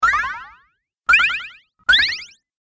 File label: Cartoon Hit And Jump Sounds; Cartoon Sound Design Sound Effects